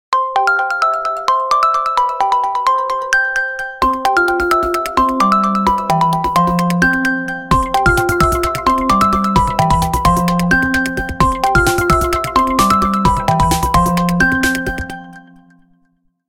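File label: Jump Music, ringtones; Gionee; S10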